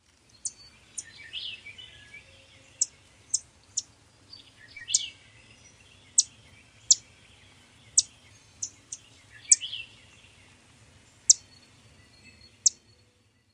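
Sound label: alarm chips, Pine Warbler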